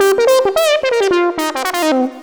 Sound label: lead (brass)